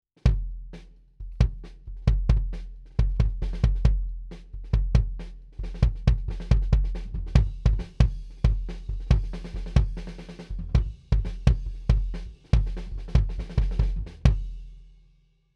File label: kick distanced